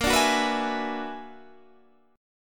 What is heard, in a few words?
B-Minor Major 13th